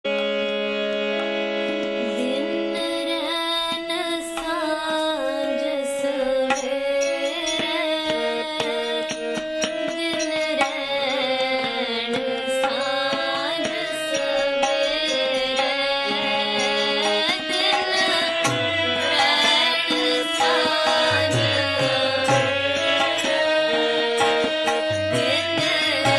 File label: kirtan; Sunday Sangat Programs (Brampton); House Program; simar dhhiaae gaae gun gobindh dhin rain saajh savaerai